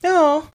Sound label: cute sound